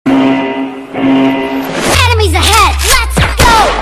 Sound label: PUBG Start Sound